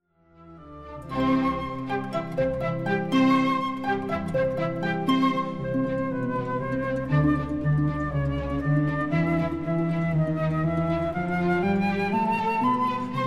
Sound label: Flute and Harp; MOZART; CTO 1st Mvt